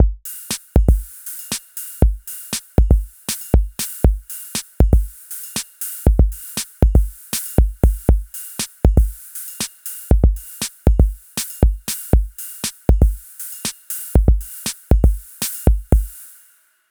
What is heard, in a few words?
DRUM BASS